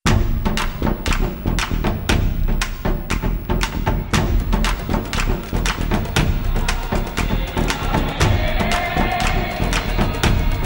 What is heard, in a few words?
African Tone